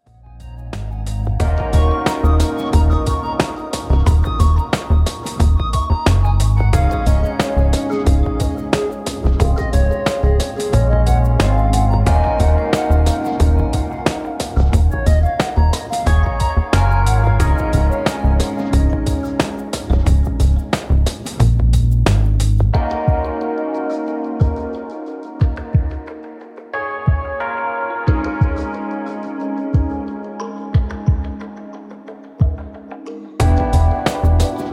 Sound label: Relax Lounge